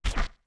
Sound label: Step Land; Deku Link landing on the ground